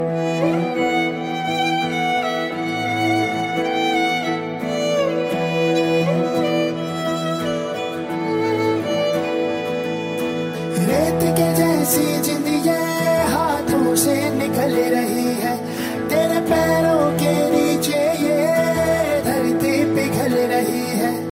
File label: ringtone bollywood ringtones